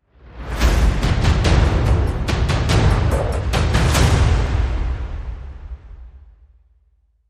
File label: Drum Sting